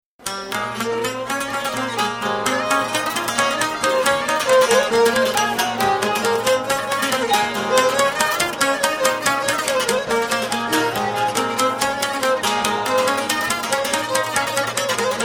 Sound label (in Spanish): laghouto